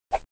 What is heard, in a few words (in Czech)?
menu zpet; BecheruvSen